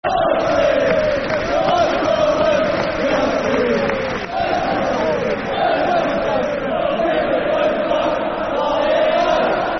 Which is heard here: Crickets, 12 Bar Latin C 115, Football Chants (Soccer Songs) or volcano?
Football Chants (Soccer Songs)